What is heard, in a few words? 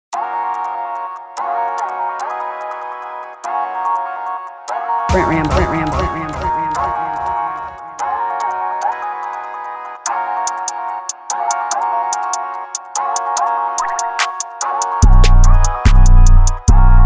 Instrumental